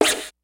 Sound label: FUNK, DRUMS; Kid Nepro; Elite